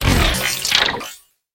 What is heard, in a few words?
impact glitch